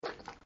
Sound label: slosh; Lewd SFX